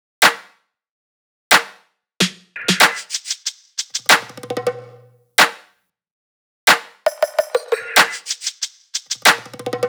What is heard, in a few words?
top loop, Drums, Loops, Top Loops, Pop; pinebluff 93; Slate; SD PASADENA Pop Sample Pack